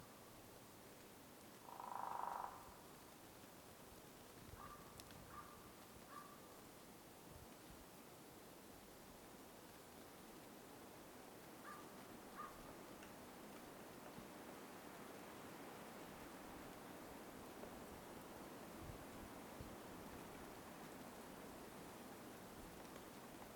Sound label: Forest Light Wind Through Bare Trees Winter; Great For Falling Snow